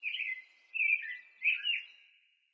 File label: Amb Birdvillage Birds